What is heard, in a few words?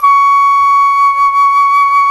Jazz Flute